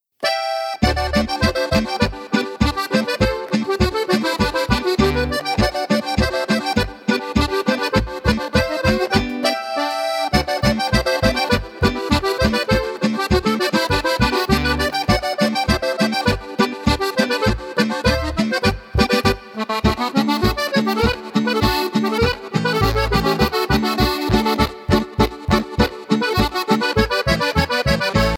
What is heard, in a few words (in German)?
Akkordeon